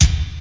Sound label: studio kick drum sound e key; kick drum samples